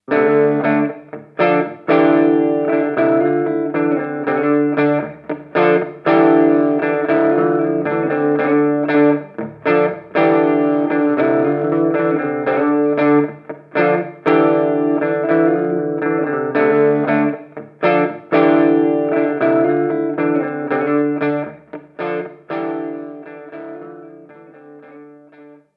Torpedo CAB M British Amp

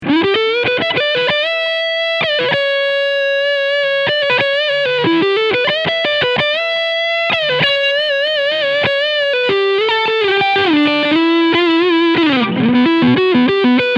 SC 550 SL Tribute Leadsound Front Pickup; Harley Benton

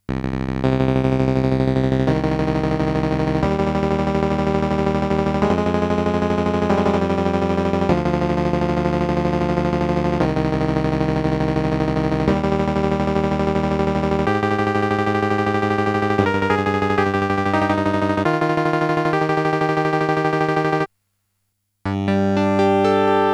Casio MT 400V Mandoline E guitar

